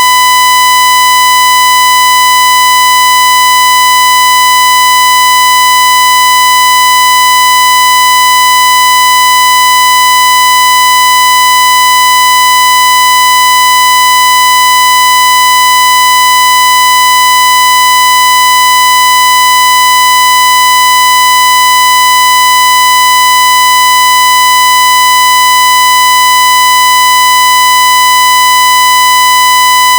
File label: 1kHz sawtooth wave; 44k; 16bit